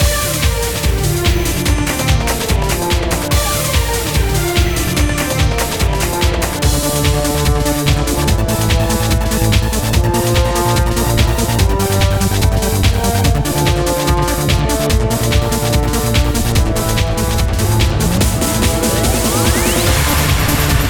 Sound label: LOOP Mission; Adventure Land; Mission 2